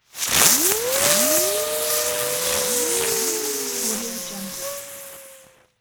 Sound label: fireworks sparks spin outs